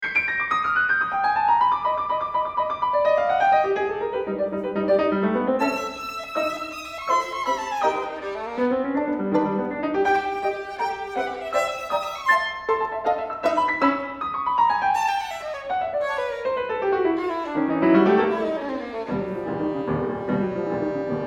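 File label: Sonata for Violin with piano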